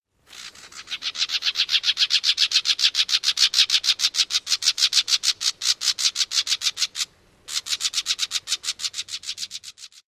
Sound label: rattle calls